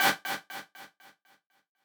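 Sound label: b stab1 a, note G octave 5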